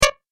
Tap Little Hit; Tapping Sound Effects

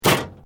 Metal Light Movement Minor; Sound Effects:Materials

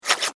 Cyborg Eye Camera Shutter; Robot Sound Effects; science fiction sound effect; star wars / trek style games; Andrew Fleming

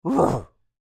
Creature Effort Huff, Human; Breathing

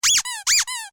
Toy Squeaky Squeak Double, Strange Toys; Miscellaneous Toy

